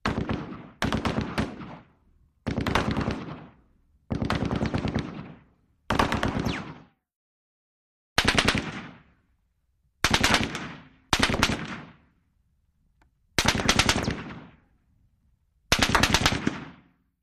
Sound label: AK47 Short Bursts Imp